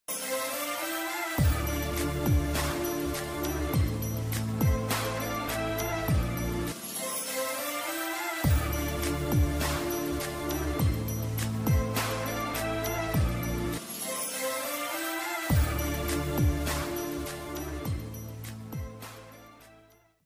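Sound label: background music ringtone love background music; varsham; prabhas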